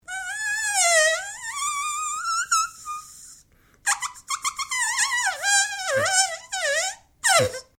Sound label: Fly Cartoon Buzzing, Cartoon fly character flying around; Cartoon Sound Design Sound Effects